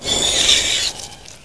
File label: alien pain; zombie plague